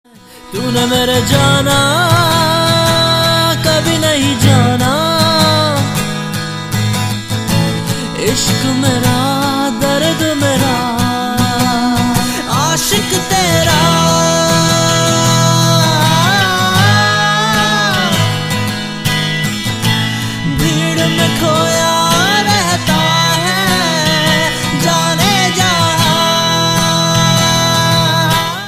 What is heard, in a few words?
acoustic guitar